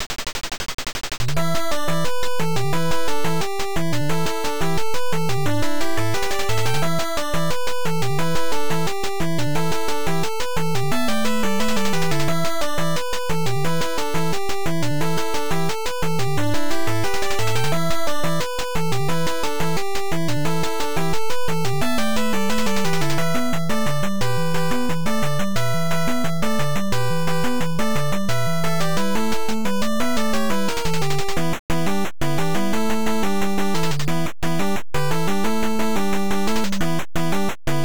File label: Battle